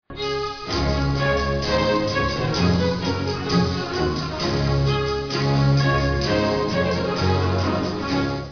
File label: gavotte; rebel